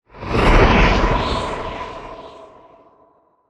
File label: stutter whoosh; Almost Maine